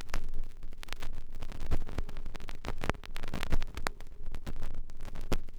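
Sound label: vinyl, click crackle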